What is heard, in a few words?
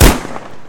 bullet spawn